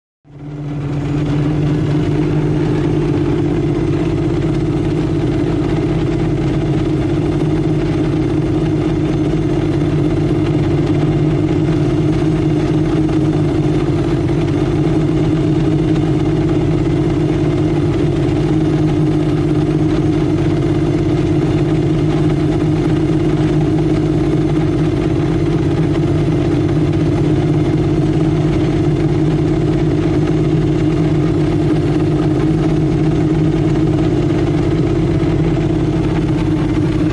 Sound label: Sports Car Idle CU